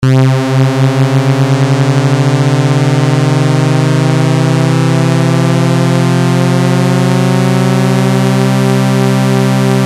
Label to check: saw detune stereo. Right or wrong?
Right